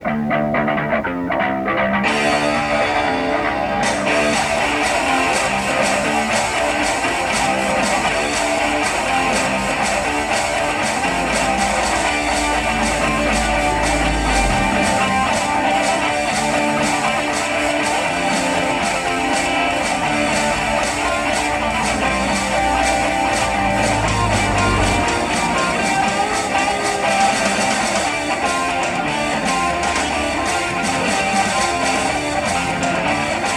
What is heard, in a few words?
Laptop Music; sons of the forest